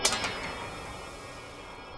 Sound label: Close